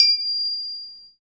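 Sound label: PRC PUTTY, Finger Cymbals; Roland LCDP03 Orchestral Perc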